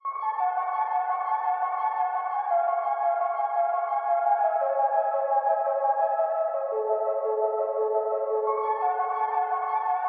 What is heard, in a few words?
114 BPM F# Min Arp; Loop Stems; Cymatics; Sensualism